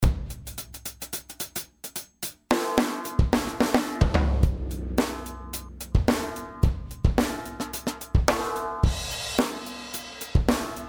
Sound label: EDM galactic 109bpm